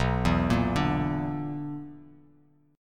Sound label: B-Minor Major 7th Double Flat 5th; arpeggiated; JGuitar